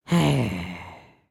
Idle Bored, creatures organic; Lego; Strombie

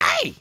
knockdown

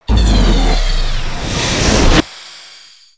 Space Ranger Gravity Well

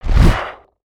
Wing Flap; creatures organic

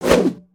Staff Spinjitzu; weapons; aronwk collection; ndaudio

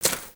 Footstep; Lego; Strombie; creatures organic

